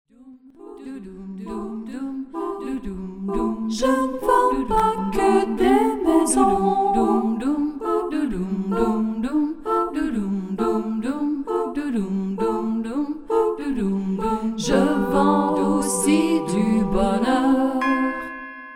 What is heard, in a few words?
jingle